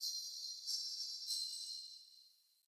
Alley Distant Chains; UnderCity

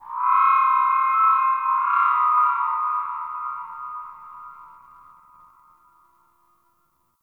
Sentient Texture Medium, Ambience; Sentient Lair

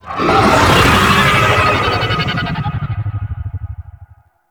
Fly By Quick F; Vehicles; Flight; Warframe; WM Liset

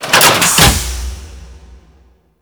Harvester Disassembler Open; Warframe; Cinematics

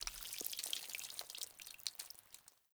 Water Shallow Exit Drips Dirt; Characters; Entity Contact Movement

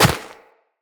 Operator Run Snow, Footsteps; Characters

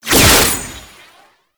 Kuva Lich Finisher Parazon Throw; Warframe

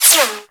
Bullet Whizzby; Warframe; Bullet Impacts; Whizzbys

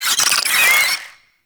Infested Ashen Vocal Attack Death; Warframe

